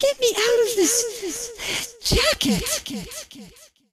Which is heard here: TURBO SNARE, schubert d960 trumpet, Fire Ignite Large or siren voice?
siren voice